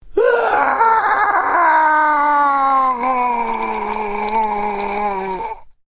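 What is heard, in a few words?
sounds of human suffering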